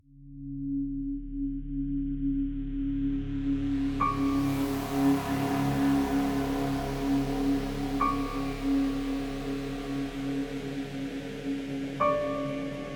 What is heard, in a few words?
Fear